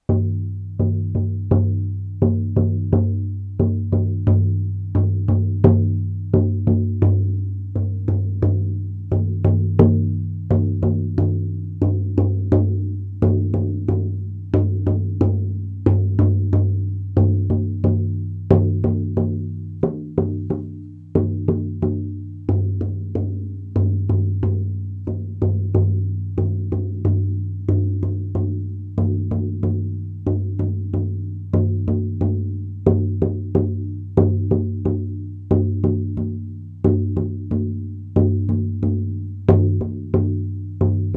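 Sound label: Red deer Spirit Unity, drum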